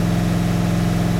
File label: Range Rover Low